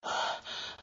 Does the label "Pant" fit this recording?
Yes